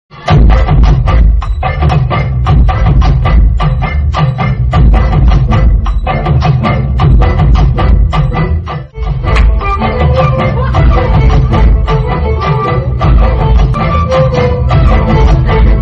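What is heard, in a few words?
Instrumental; Thiru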